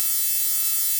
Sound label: fifths